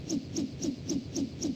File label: hover dam; sarge